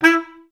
BCS STAC E3; Best Service; Peter Siedlaczek's Advanced Orchestra

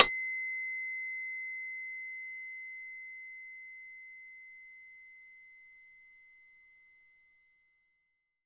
Metronome, Roland JV-2080

